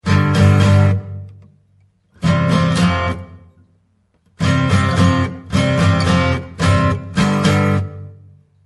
acoustic guitar

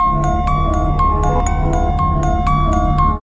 scary message

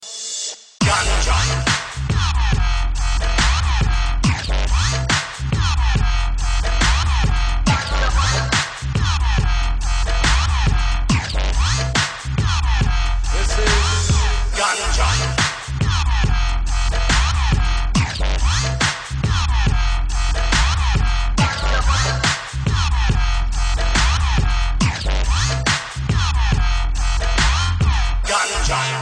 dubstep

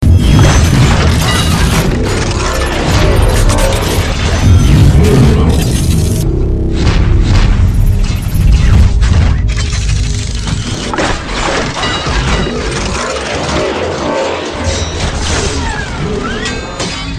transform

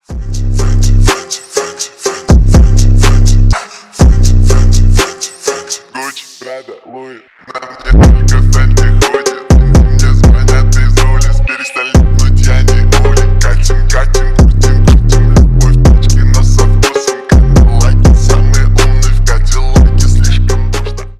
phonk remix

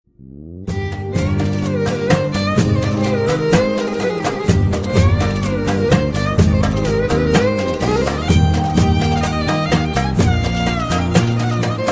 turkish keman

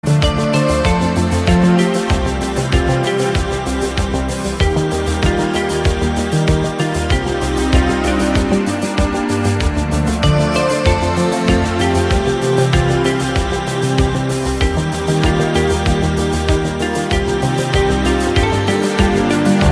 ringtone 80s

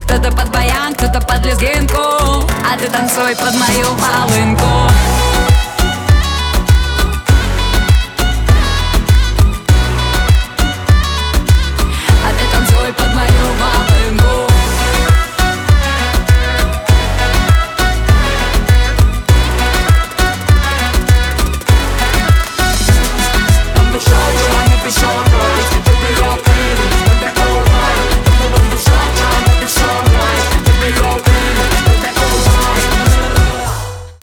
volinka

